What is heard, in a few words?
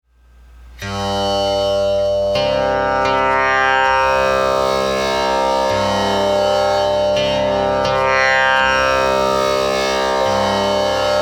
personal tambura slow